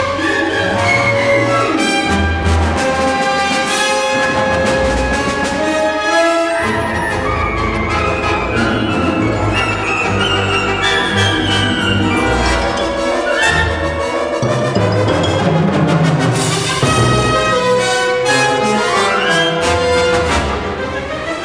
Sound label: loop; anakin